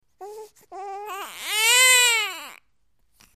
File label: Baby Sniffling Whine